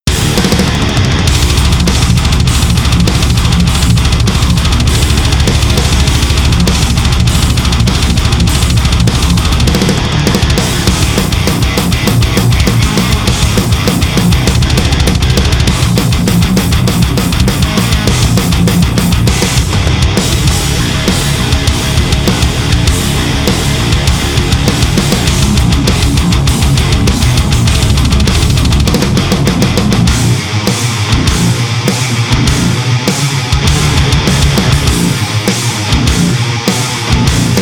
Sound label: metal